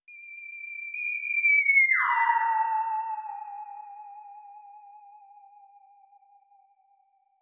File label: Sci-Fi, doppler shifted ringtones iphone